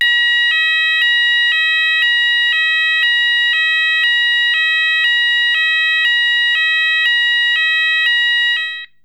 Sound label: Sounder